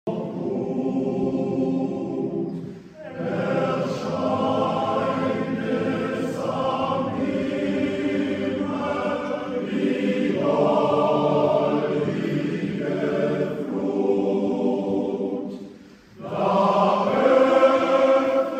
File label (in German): Massenchor